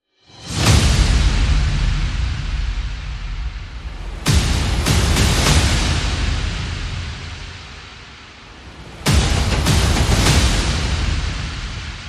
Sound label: Percussion and Effects